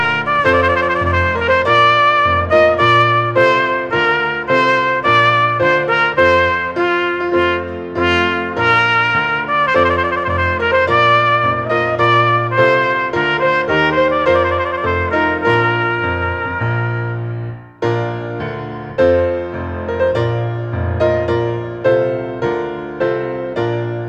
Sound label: Trumpet Voluntary; Wedding